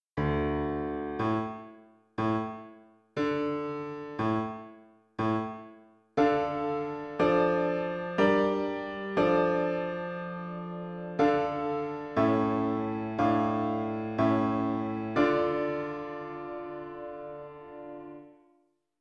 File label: Piano